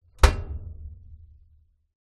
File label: household clothes dryer top loader lid down inside drum perspective